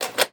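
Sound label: Hospital Room Interior; Medical; Blastwave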